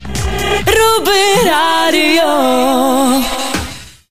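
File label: station jingle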